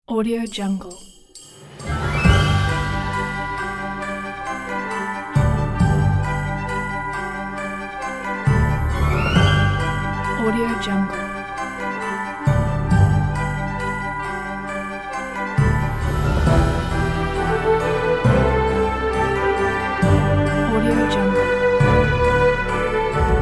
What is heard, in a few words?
christmas magical orchestral; justneedstudio